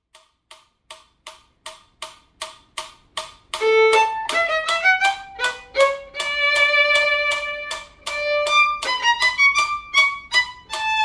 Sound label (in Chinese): 小提琴; 示範演奏; 賽滋 第四號學生協奏曲 第三樂章; 新版 篠崎小提琴教本 第三冊